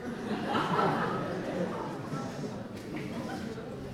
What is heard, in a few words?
Laugh